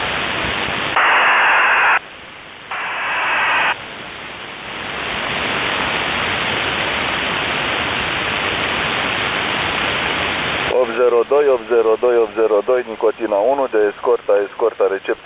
66905usb ale3g-188-110a melp600

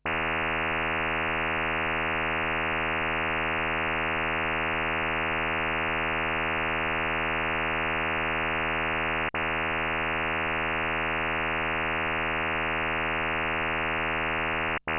chirp

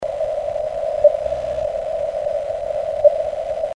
beacon cw

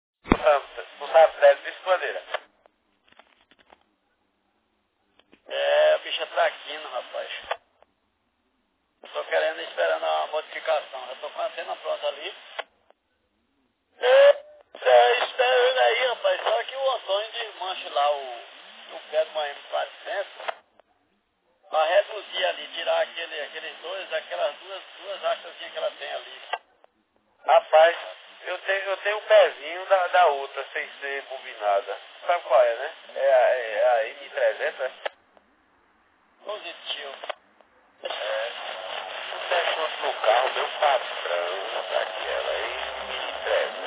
NFM

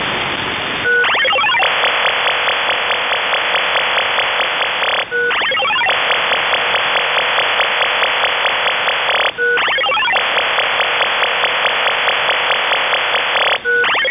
ofdm-64

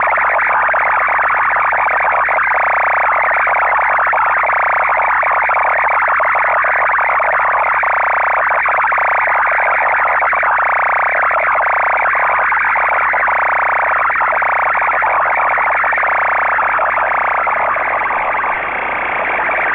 unid 10180khz

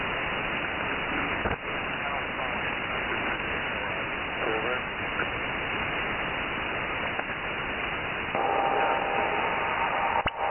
nu disco

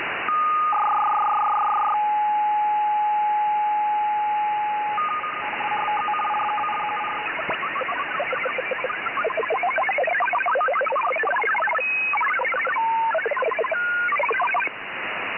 10115 USB

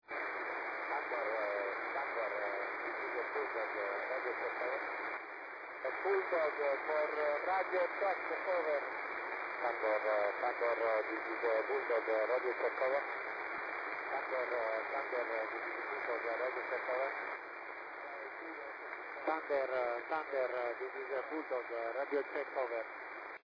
thunder

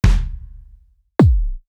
kickdrum

